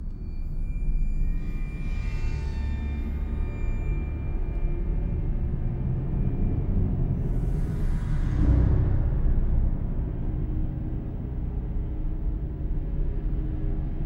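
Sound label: alert orchestra; the walking dead survival instinct gamerip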